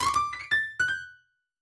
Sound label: Samsung Whistle